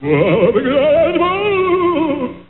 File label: ghost